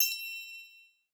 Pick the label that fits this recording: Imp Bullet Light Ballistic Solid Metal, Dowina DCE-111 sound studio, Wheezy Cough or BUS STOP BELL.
BUS STOP BELL